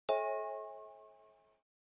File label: OS Sound Notification